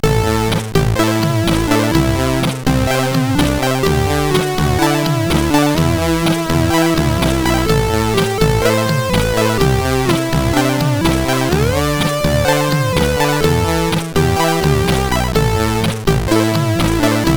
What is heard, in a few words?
Short Tune, CSG8580; sid; CSG8580R5